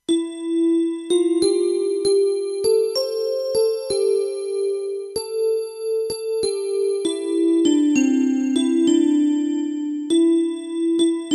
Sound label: melody, subway; kobeshika; jikoku; transport